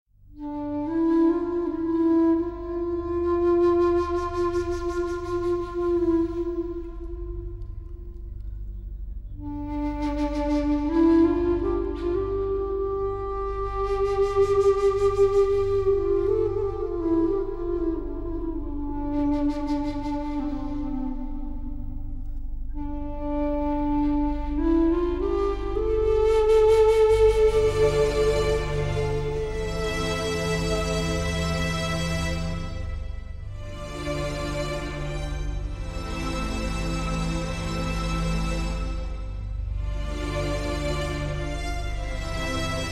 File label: Theme (Slow); Tahaan